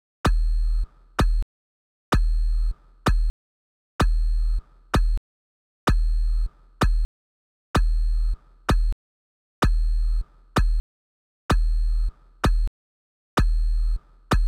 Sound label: tom sub, Key C#, Bpm 128; Big Room House; Loop Nerds; Big Room House Allstarz